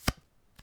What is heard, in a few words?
card Slide, Casino; Kenney's Sound Pack